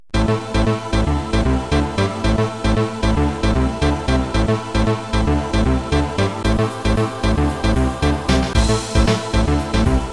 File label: Plantation Crash (UltraSound Version); zone 66